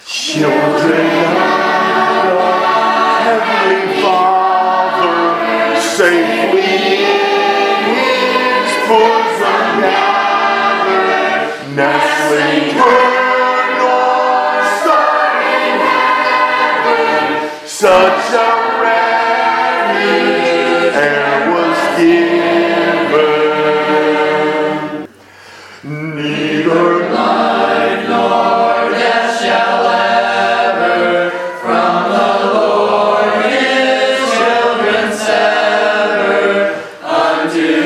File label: singing class, hymns; children of the heavenly father